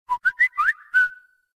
Whistle

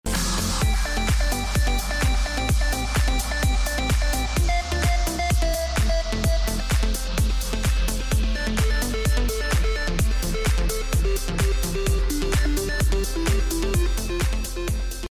dolby atmos music

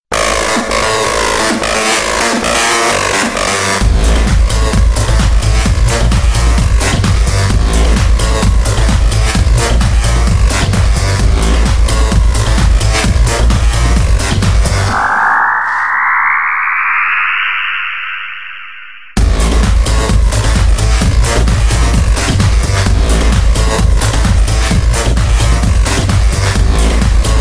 Express Music Dj Mix

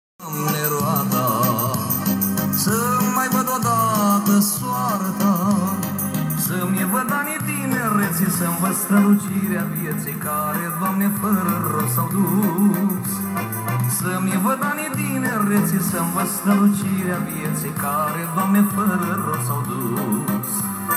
romaneasca

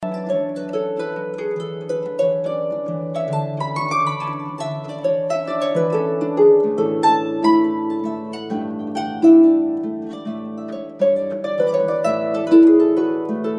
Relaxation Harp

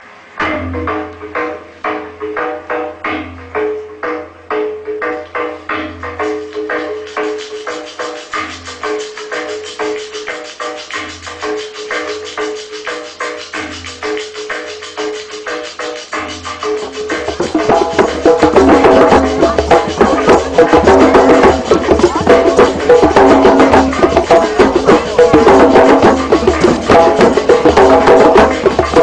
Pagan Drumming

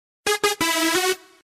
Hummer message

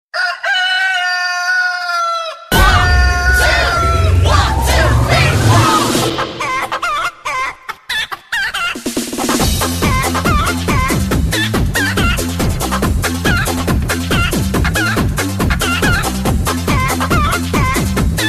Funny Chicken Tone

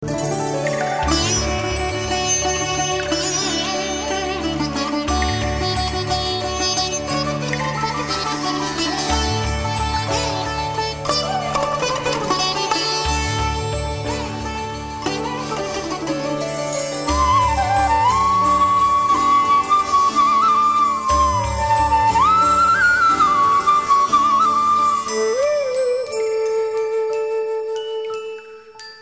Veena

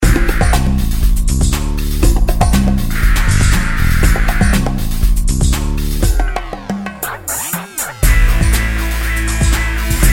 Awesome Guitar

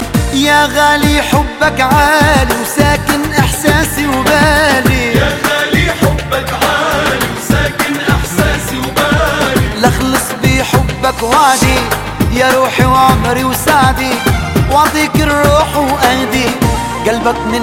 Arabic Love Song

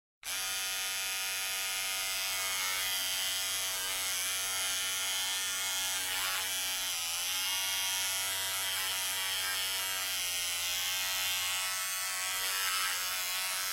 Electric Shaver

